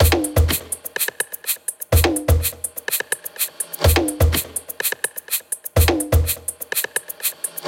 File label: Percussion 125Bpm, Techno; Percussion Loops; Coastline; Ghosthack Advent Calendar - Day 6 - Techno Kit